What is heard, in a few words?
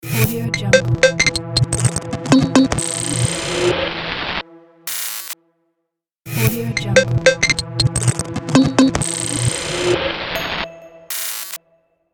glitch logo